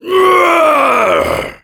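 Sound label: cheers, French; Heavy; "Ouais !"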